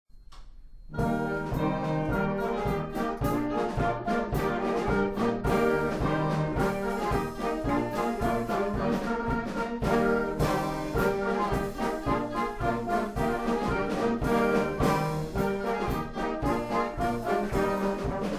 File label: German Polkas